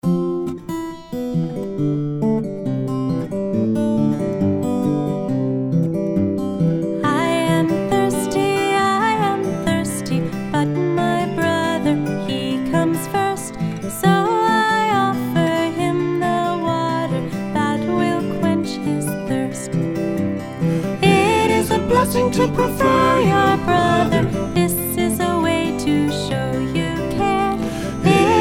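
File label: Song, English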